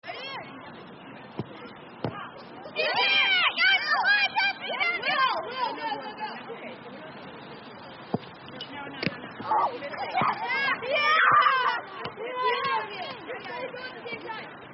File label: Kids Football; Sport